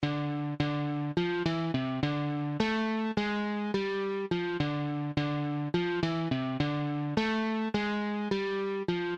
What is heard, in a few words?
Riff